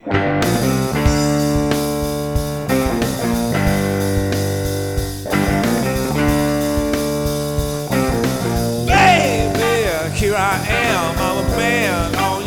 R And B; Wedding Band

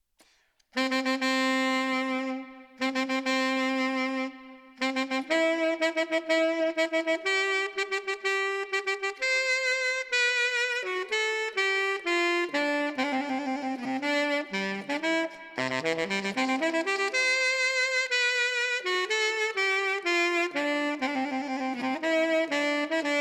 Solo Sax; Saxophone; Mendelssohn

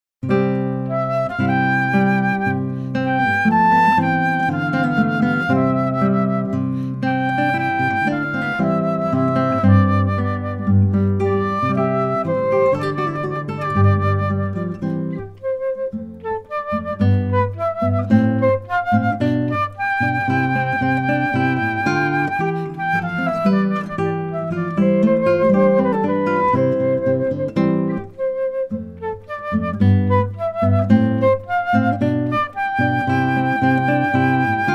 Flute And Guitar Duet; Come Again

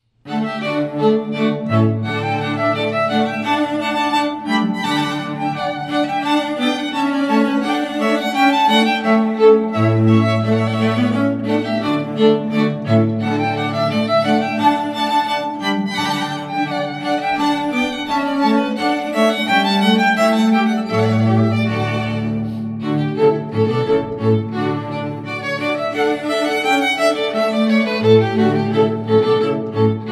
Rondeau, string quartet; Mouret

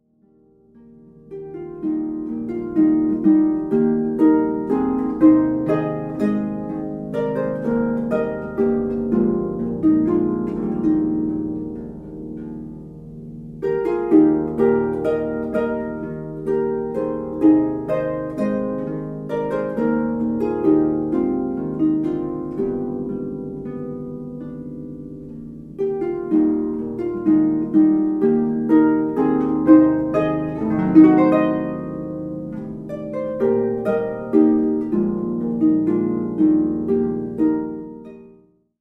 Irish, harp; Believe Me If All Those Endearing Young Charms

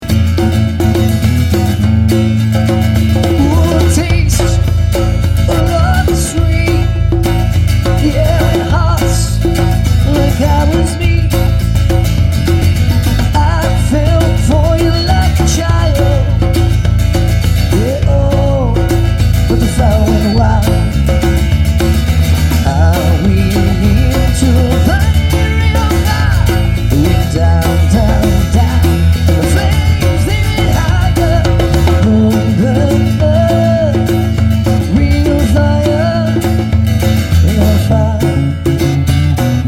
Acoustic Trio; Cover Band

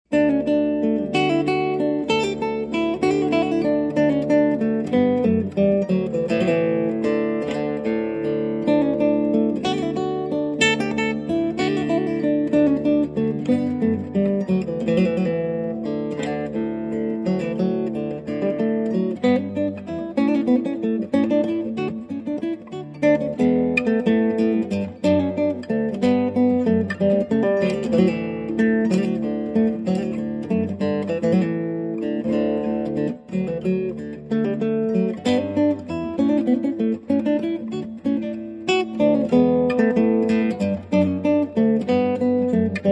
Lute Music, acoustic guitar; Julian Catford

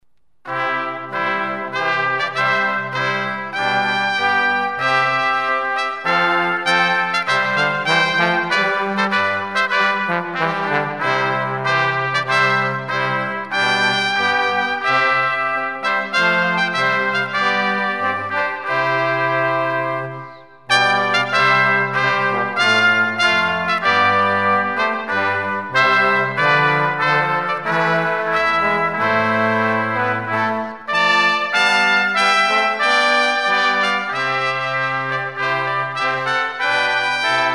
brass quartet; Te Deum

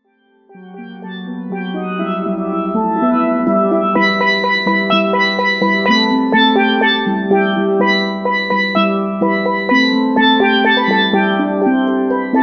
steel drum

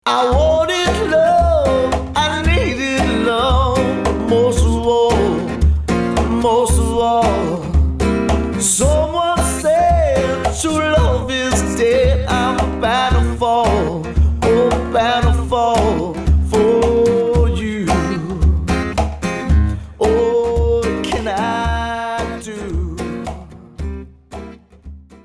Cover Band

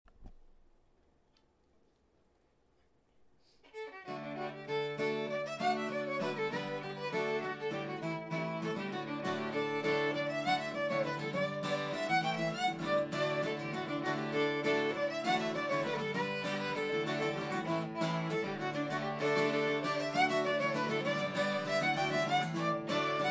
Hornpipe, celtic band

